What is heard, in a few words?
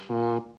Frrt